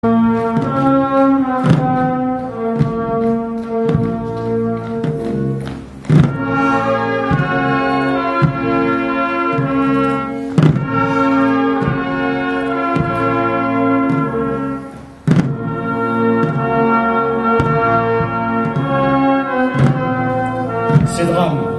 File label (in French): MARCHE FUNEBRE